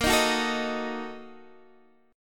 Bb Major 7th Suspended 4th Sharp 5th, Guitar, Open D